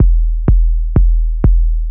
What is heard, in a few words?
Kicks 125